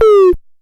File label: Drop Hi, Stylophone Beatbox